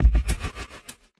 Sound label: Kick; BlissedOutBeats; Touch Loops; Drums